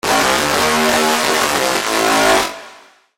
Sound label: Lead - Harsh; Routed Sound; Vital Expansion - Chaos Bringer Vol.1